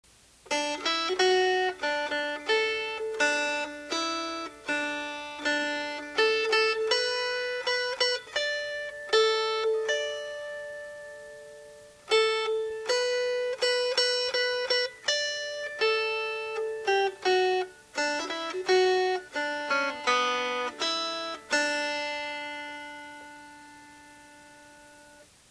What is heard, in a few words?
Melody